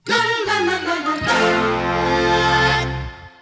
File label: Goal Fanfare; crash bandicoot series sound box; Dead Heat; 2nd Place